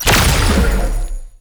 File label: Shot Large; Duviri; Incarnon Adapters; Weapons